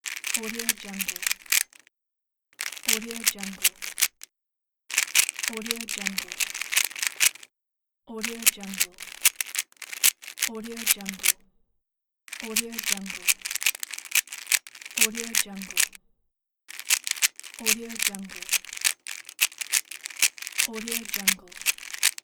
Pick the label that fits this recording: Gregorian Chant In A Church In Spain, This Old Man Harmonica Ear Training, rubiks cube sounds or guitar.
rubiks cube sounds